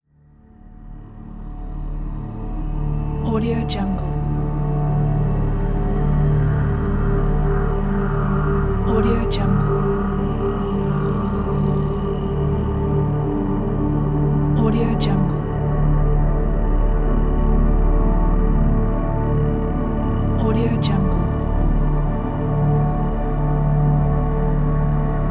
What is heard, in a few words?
drone